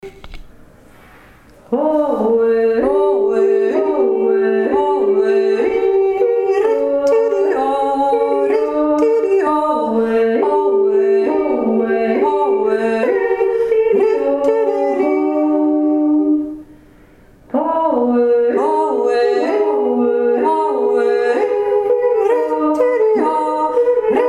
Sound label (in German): kanon beide stimmen